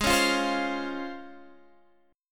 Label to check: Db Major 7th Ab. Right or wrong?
Right